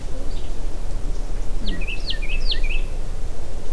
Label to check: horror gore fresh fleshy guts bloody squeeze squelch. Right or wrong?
Wrong